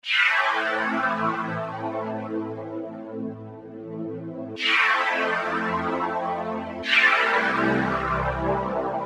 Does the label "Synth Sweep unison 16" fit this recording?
Yes